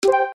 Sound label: Video Record, ui; Tecno Spark 3 Pro